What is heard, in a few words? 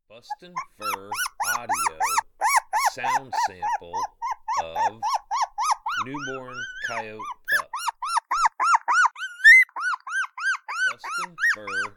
Newborn Coyote Pup